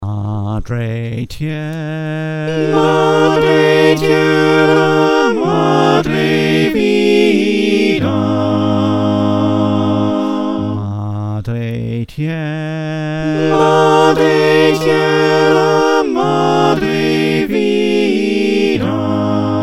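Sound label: soprano alto tenor bass, choir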